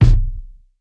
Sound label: bassy steel kick drum sample b key, Royality free kick drum single shot tuned to the B note; kick drum samples